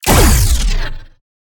Shot Pulse, Sci-Fi Energy Weapons; Rescopic Sound

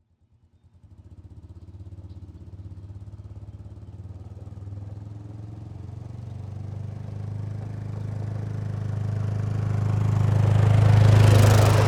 ATV Mono Driveby, ATV ARCTIC CAT 650 H1; DavidDumais

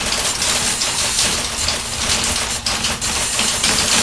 Office; hl2

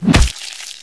claw strike, zombie poison; hl2

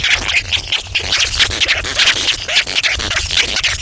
voice, antlion grub; hl2

